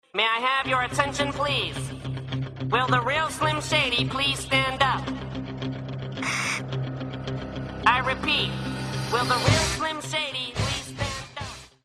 musica

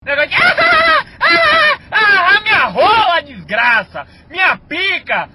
hahaha minha rola

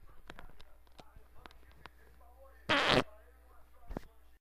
peido

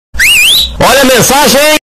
fiufiu; olha a mensagem

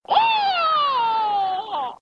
grito; liu khan

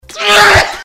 submarino explodindo fundo mar

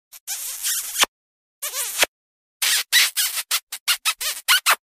som de beijo

